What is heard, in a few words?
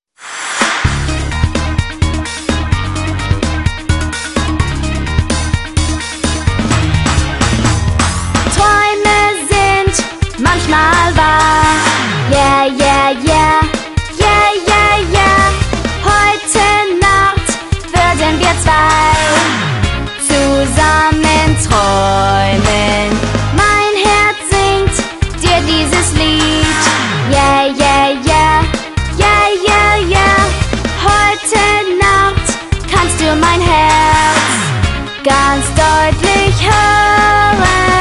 Love Ooh Ooh Paradise German